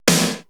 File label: 80S DRUM SET; Best Service ProSamples vol.55 - Retro Sampler; AKAI